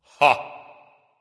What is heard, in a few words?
"heh heh"; smite; voicepack; ullr